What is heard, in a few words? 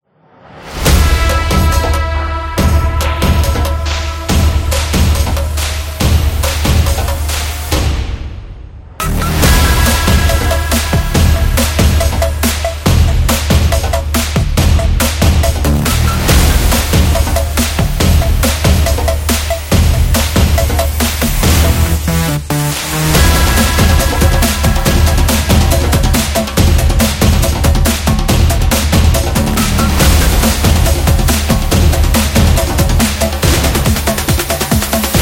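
Sport Hype, Football percussion background music inspired by samba rhythm, stadium energy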